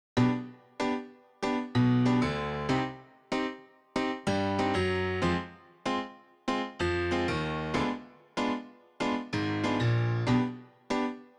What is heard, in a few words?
PIANO